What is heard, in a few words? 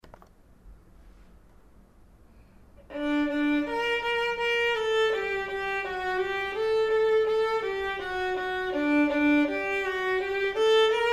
perf vln